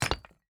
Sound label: mine stone